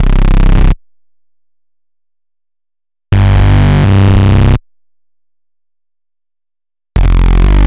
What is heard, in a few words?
Extreme Distortion